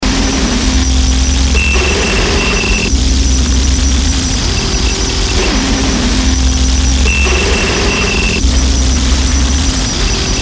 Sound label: crushed cassette